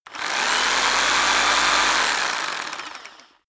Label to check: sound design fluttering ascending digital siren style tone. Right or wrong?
Wrong